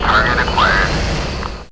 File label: player aerial Bombardment